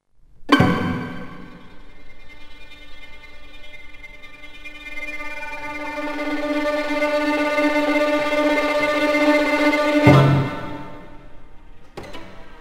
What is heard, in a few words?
string quartet